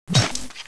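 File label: knife hit body, weapons; AoMClassic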